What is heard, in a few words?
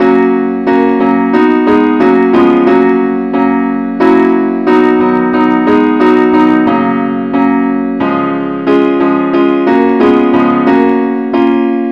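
advanced accompaniment key F sharp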